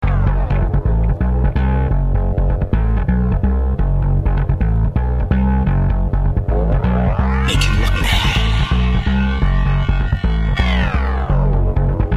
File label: chopper music; Lego Basics Demo